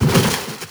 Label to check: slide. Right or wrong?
Right